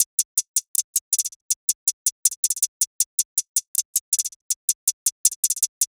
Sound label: 160 hihat loop sneaky; THELABCOOK